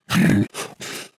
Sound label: snork take hit, mutants; STALKER; customnpcs